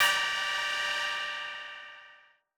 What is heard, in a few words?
M Dynamic Tech G4; KG MegaSaur